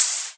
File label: Open Hihats, Cymbals; Vengeance Samples